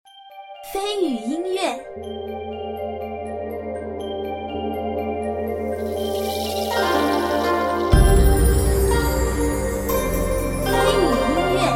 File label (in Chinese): 禅宗